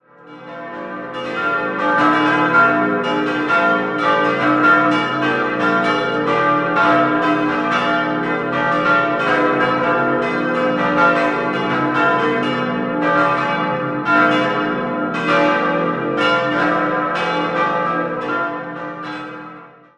Buchenberg, Pfarrkirche, Glocken; St. Magnus; Memmingen; 1861; Gießerei Hermann

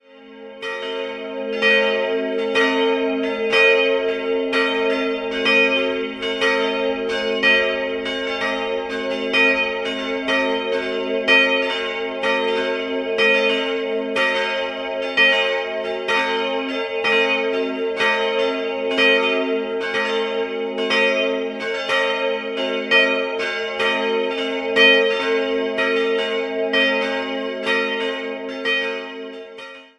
Breitenfurt, Glocken

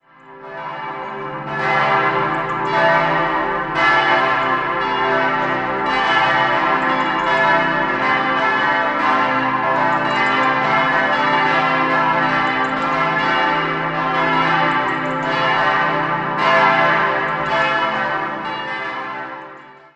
Landshut, Glocken; Pius

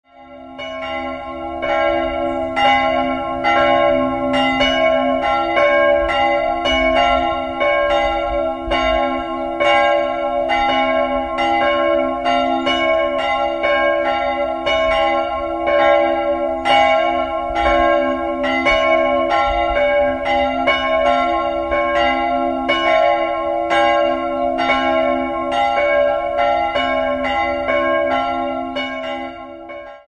Ottmaring, Glocken

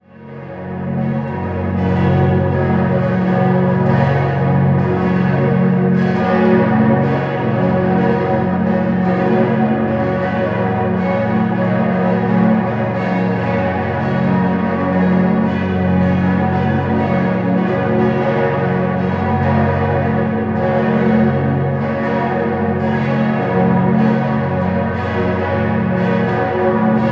Aarau, Glocken, Pfarrkirche; Aarau AG; St. Peter und Paul; 1960; 1976; in Aarau gegossen; des'-es'-ges'-as'; Gießerei Rüetschi

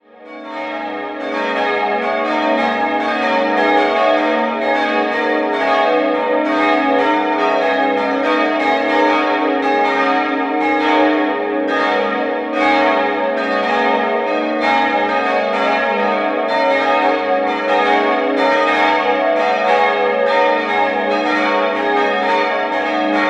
Nürnberg Röthenbach Nikodemus, Glocken

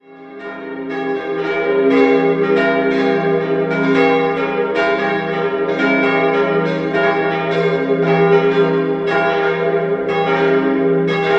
Dünzing, Glocken

